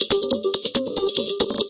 140 rolling filtered techno percs, drum loops and breaks